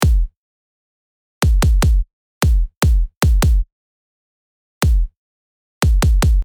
kick

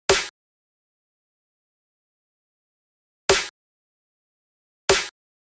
snare

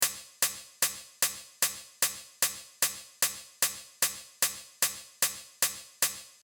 open hihat

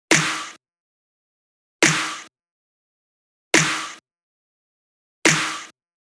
snare

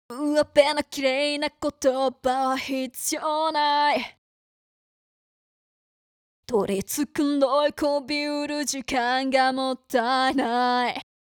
channel EQ

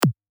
kick

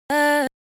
Triming vocal

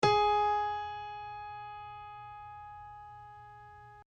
piano

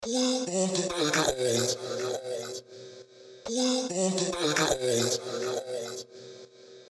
vocal

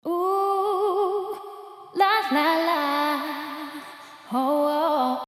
Airy; リバーヴ

